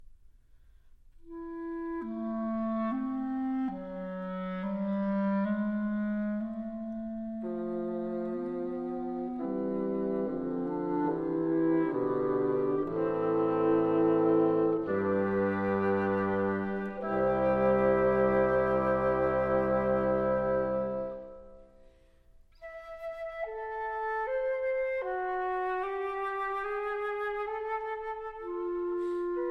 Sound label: Quintet, Adagio, Allegro molto vivace, Woodwind Quintet